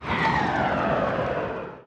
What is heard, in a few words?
Hurt